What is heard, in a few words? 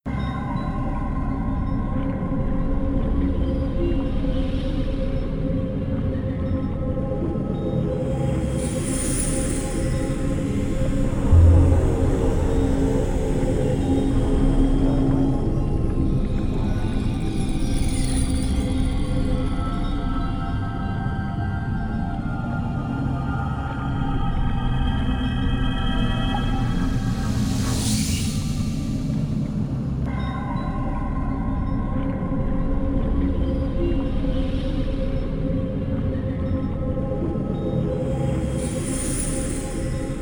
Dark Caves, Ambient; rayman 2 remastered soundtrack